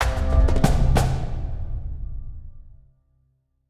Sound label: Game Over